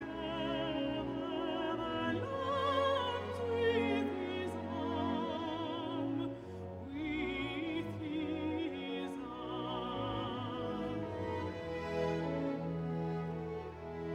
Koor dames met solist vrouw

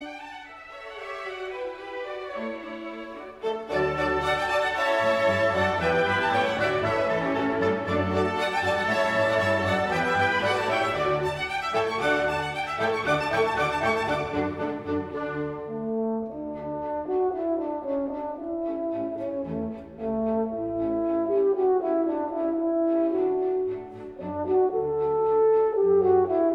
Allegro conc voor hoorn en orkest; Mozart; KV 412

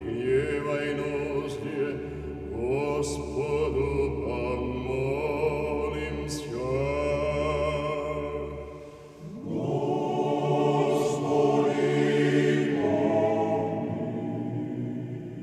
Koor (mannen); Don Kozakken

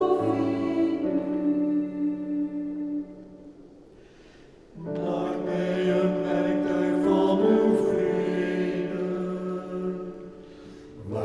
Koor gemengd; Vredeskerk koor

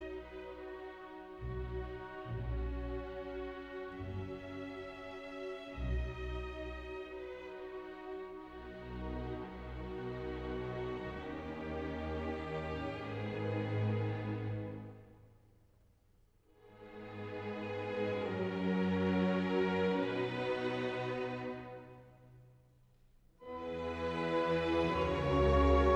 Pianoconcert; No 5 ES-dur Op.72c Emperor

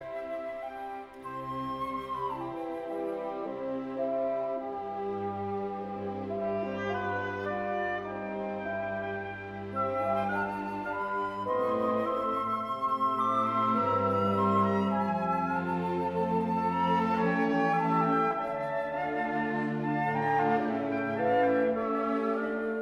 Andante (pianoconcert); Mozart; 17 K 453

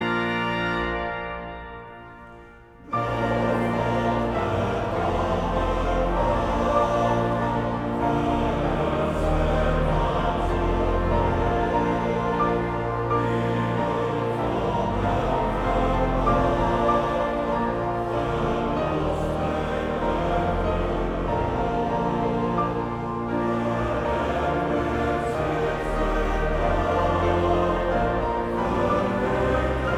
Koor mannen

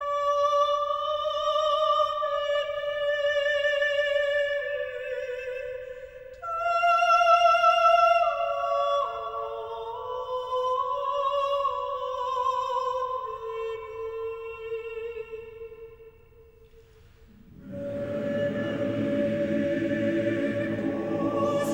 Koor gemengd met solist vrouw

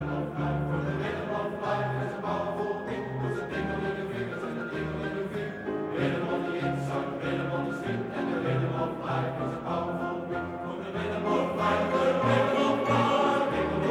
Koor (mannen); Haghe Sanghers

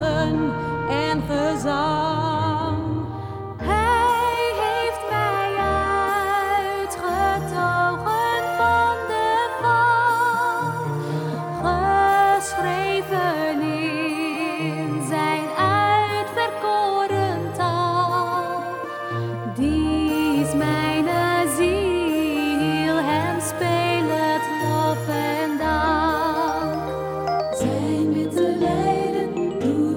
samenzang

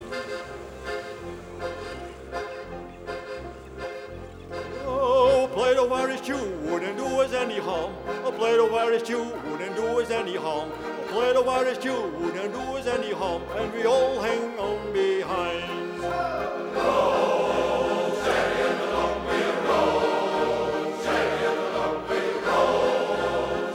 Koor (mannen); Scheldeloodsen

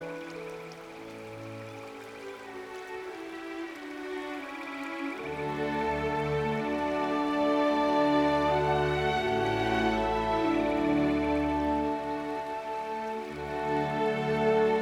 Adagio (klarinet conc met natuur geluiden); Mozart

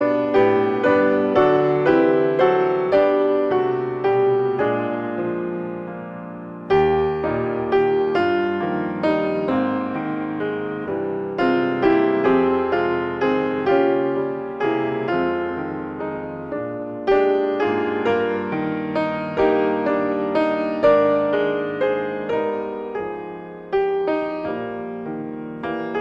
instumentaal

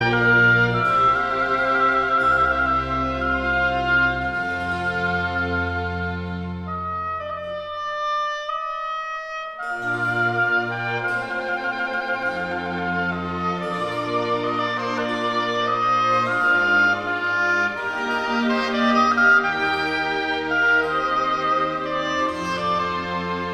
Hoboconcert

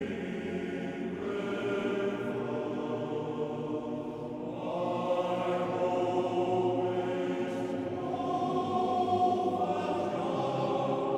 Koor mannen; Mastreechter Staar

